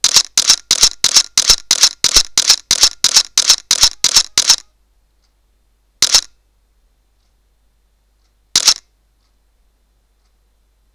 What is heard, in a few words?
Alpha DSLR-A850 continuous RAW; Sony